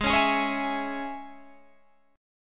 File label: G sharp Suspended 4th Sharp 5th Guitar Half Step Down chord, down